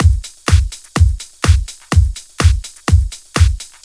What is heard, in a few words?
Drum Loops, 125 BPM